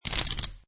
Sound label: Poker Chips Drop Stack Of Chips On Stack Of Chips